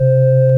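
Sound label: ORG Vox, Continental organ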